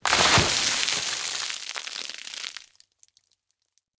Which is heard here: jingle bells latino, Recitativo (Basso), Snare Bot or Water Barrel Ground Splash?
Water Barrel Ground Splash